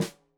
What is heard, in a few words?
Snare Stereo, Drums; Deeply Sampled Drums